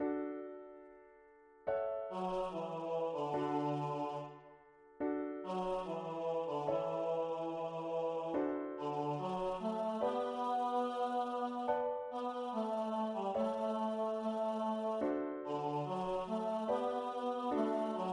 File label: Bass synch